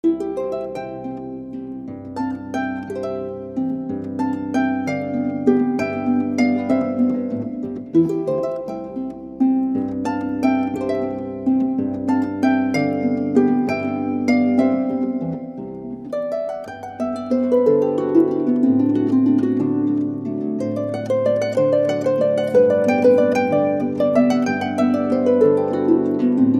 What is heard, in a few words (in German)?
harfe volksmusik